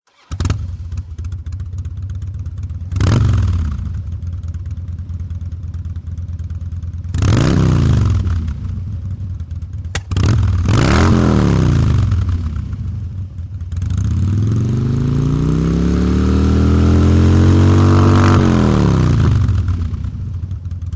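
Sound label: harley dyrchit, Harley rumbling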